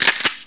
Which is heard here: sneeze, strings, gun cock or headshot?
gun cock